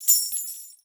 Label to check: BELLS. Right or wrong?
Right